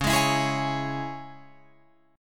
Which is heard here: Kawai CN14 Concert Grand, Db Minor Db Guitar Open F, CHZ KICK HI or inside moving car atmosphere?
Db Minor Db Guitar Open F